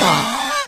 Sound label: gas gasp, smoker, voice, idle; Left 4 Dead 2